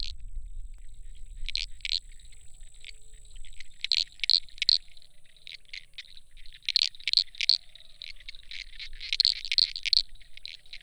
Lysapsus limellum, cantos; herpetologia

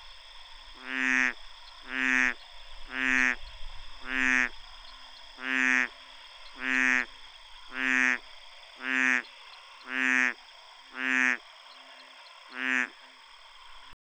Trachycephalus typhonius; herpetologia; cantos

